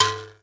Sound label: MBIRA A 2; BS MBIRA1; Heart of Africa